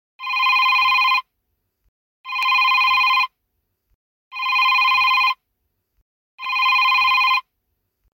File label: Doogee Basic Bell Ringtone